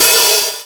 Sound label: Linn, LinnLinn Drums